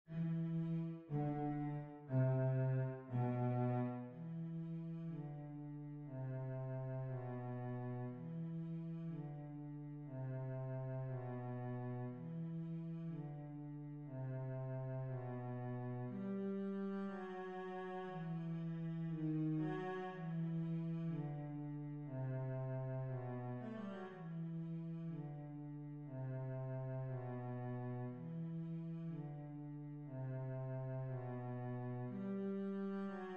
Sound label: swing